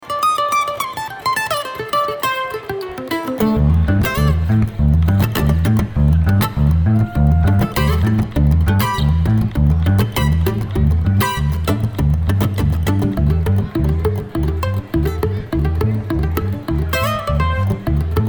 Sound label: Mandolin Bass Jam